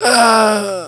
DYING

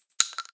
case, CAWS; Caliber

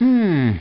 humm; BattleSNDS

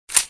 empty, 9x18mm; Caliber

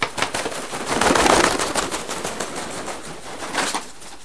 CROW FLY

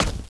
WOOD IMPACT

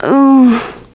humm; BattleSNDS

